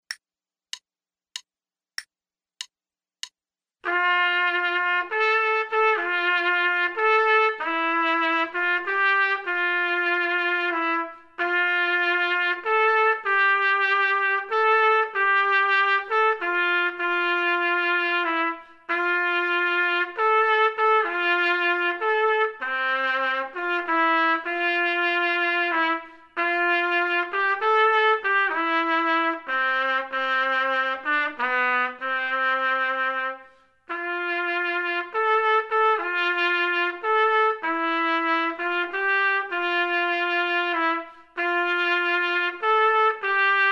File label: trumpet 2, Musette; metronome setting for this performance is dotted quarter note = 88; Selected Duets for Trumpet, Page 24 Number 21, Musette, performed by David Summer.; Trumpet 2 Only